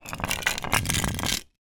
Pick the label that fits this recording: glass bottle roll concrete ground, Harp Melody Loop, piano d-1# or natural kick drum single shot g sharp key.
glass bottle roll concrete ground